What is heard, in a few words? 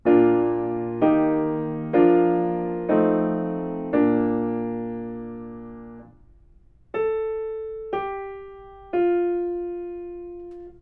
melodic fragments; ear training